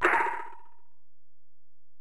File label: EPerc, Alesis DM-PRO; 300 Drum Machines